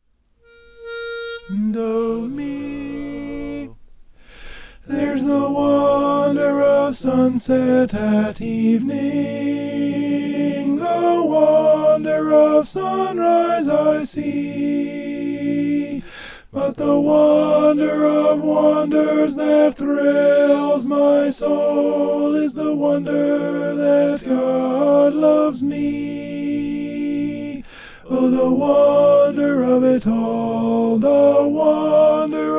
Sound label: alto